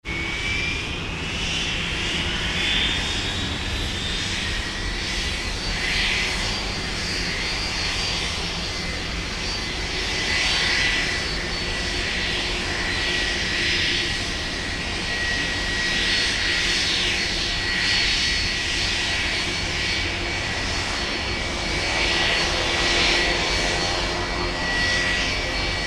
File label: airplane turbine engines